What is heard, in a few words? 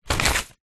foley paper airplane pick up; zapsplat